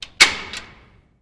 Safe Door Walk Open, Safe Door, Walk In; safes

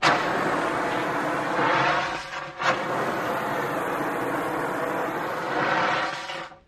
Extinguisher Blasts; fire extinguisher

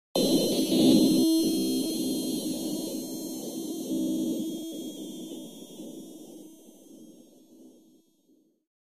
Static HF Digital Static

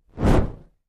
Torch Movement; torches

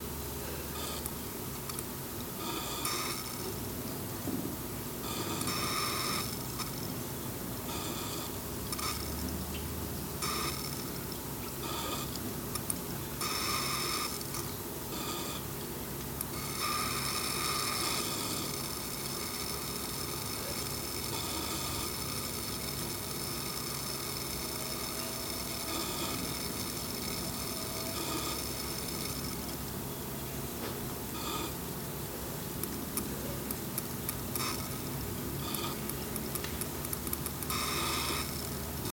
Berry Fizz, eating & drinking

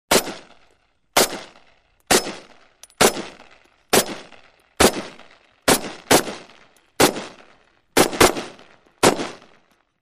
M14 Semiautomatic

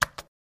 Computer Keypad Car, typing

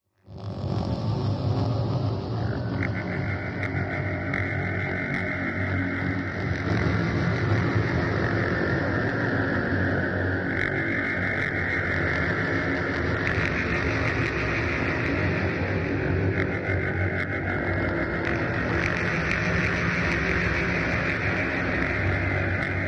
Crix Breeze Night Swamp

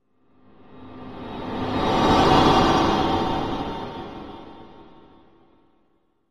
Music Eerie Metal Ch, pads

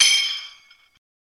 Metal Clang Echo

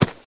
Horse Hoof Thud

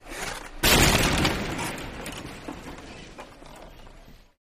Hockey Player Skate

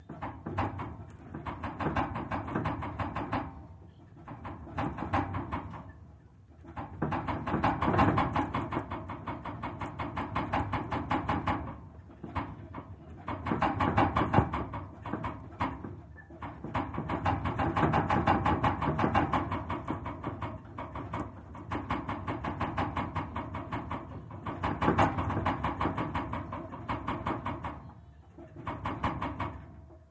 Car Trailer Jiggling; hits & rattles

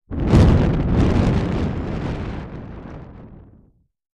Fireball Flame Effec; fireballs

